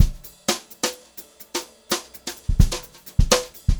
Brushed Drum Loops; USB Soundscan vol.39; AKAI

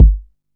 Kick, Drum & Bass; AMG - Prototype Drum & Bass

